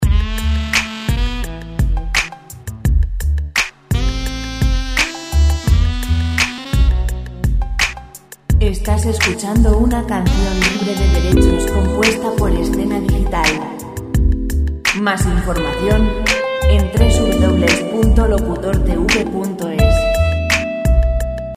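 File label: 85 lento free music; relaxing music background music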